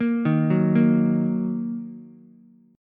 A sharp Suspended 4th Sharp 5th A sharp down Ukulele; arpeggiated; JGuitar